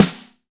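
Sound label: SD, snare drum, Dance-Kit; 90 s Sample CDs; Sound & Vision - Gigapack I CD 1 (Roland); Real-Kit m13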